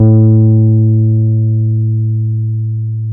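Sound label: Hohner Pianet A3; Keyboards of The 60's and 70's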